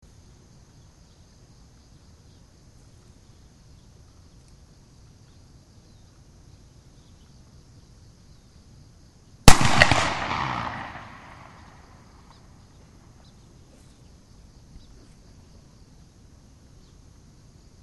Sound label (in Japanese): suzume